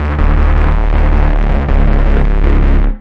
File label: break your neck bassline; BASS LOOPS